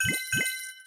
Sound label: open lucky red packet